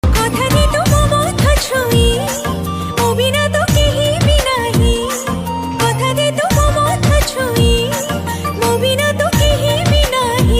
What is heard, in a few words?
Odia female version